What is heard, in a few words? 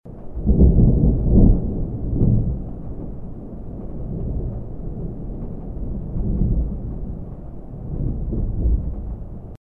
thunder; Dystopia; silo